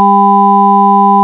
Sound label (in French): son clair